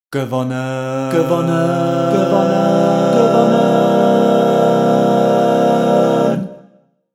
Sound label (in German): gewonnen chor reverb more stereo